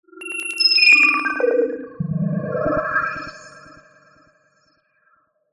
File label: sound design bright twinkle echos weird; zapsplat